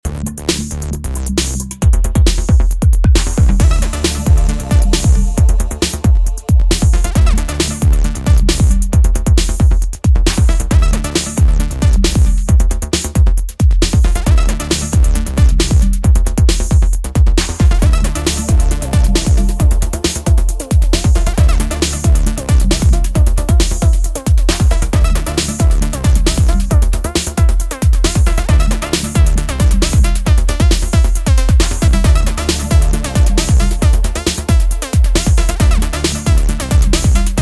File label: Rhythm and Bass